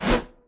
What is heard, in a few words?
blade 1 hand swing